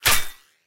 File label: bullet hit armor; War Thunder